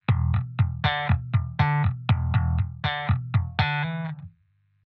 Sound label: chopper